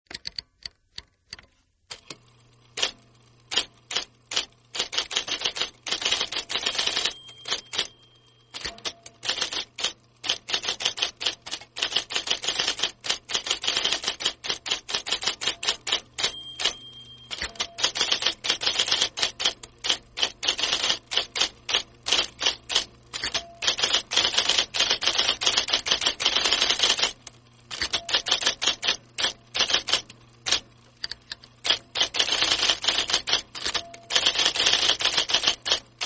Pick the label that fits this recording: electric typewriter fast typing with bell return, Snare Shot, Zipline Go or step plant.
electric typewriter fast typing with bell return